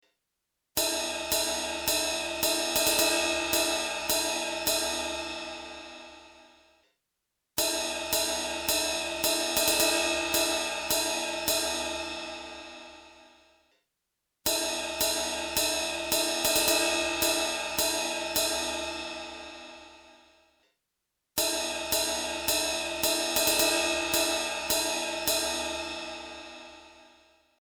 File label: Ride Outer Edge 640Hz; frequency training series